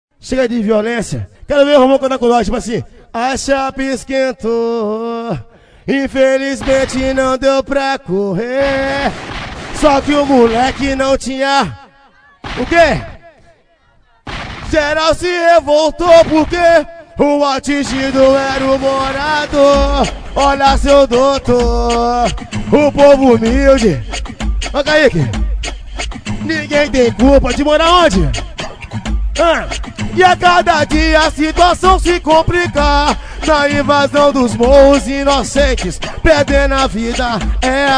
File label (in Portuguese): ao vivo, funk, hip hop; no romao